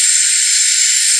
Flange, train; OpenBVE

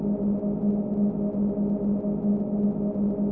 motor, train; OpenBVE

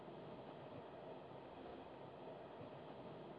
Run, Train; OpenBVE

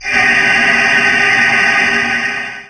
Air, Train; OpenBVE; Szemely

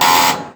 buzzer, R62 Kawasaki, Train; OpenBVE

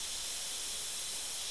Spring, Train cl158; OpenBVE

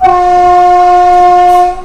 klaxon, Train; SA134-003

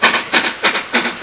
Motor, Train; OpenBVE

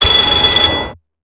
aws bell, Class 47; OpenBVE; Train

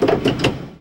pa off, Train; OpenBVE

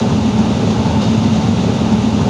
run, Train cl158; OpenBVE

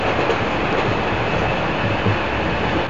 RUN, Train, R62 Kawasaki; OpenBVE

